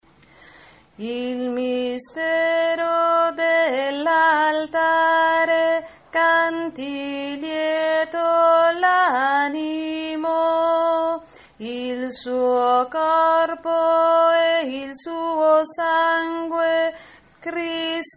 pange lingua contralti

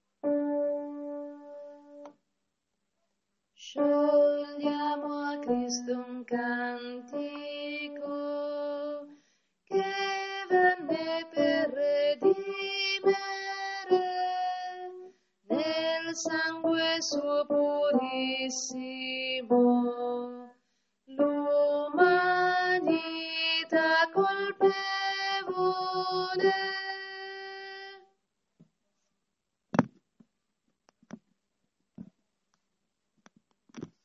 noi canteremo gloria a te contralti

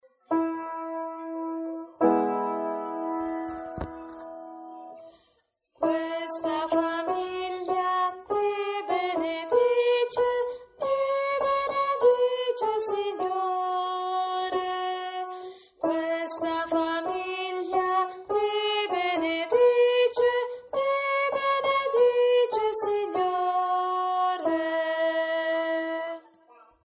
questa famiglia soprani

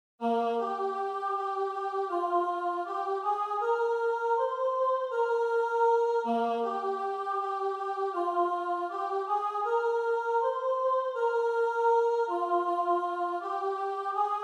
beati i poveri in spirito soprani

